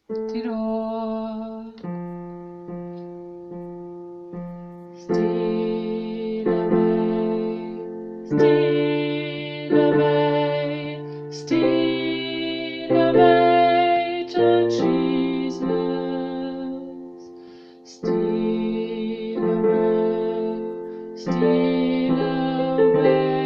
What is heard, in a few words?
steal away tenor